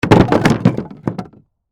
Crash Wood Debris, Crash, Wood, Debris, Drop, On, Wood; Materials